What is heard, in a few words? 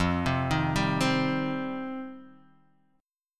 G 7th Suspended 4th Sharp 5th F